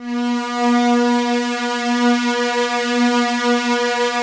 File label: ATMO PADS; Best Service; XX Large - Pads